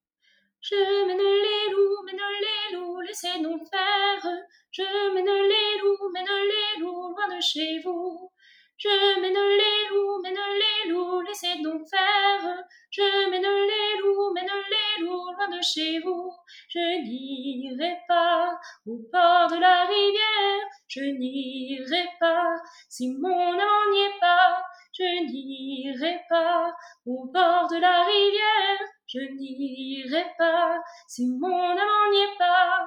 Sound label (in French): Voix lead